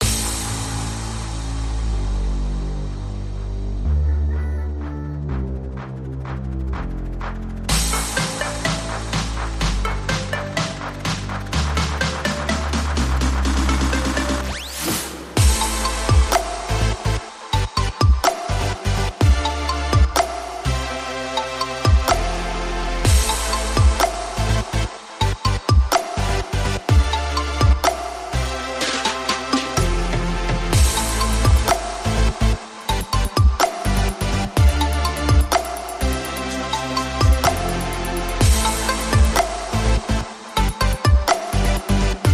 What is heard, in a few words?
Pop Trap